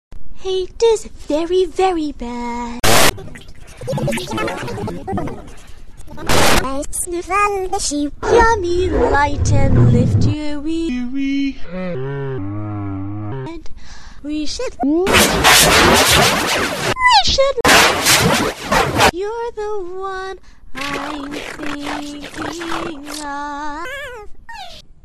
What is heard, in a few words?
happy healing song